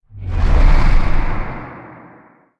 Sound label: growl, enderdragon